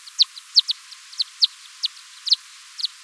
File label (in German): wasser